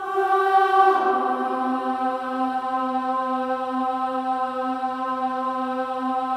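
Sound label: Voices, INTERVALS, 5TH DOWN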